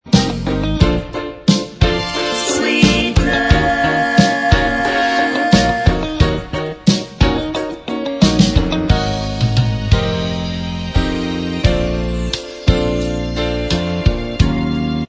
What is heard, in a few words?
Bvox